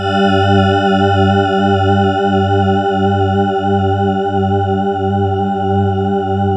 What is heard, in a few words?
WALDORF MW; Rubber Chicken Software Sample Bank